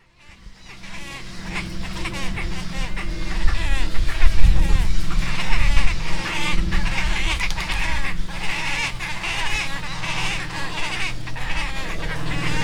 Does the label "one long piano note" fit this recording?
No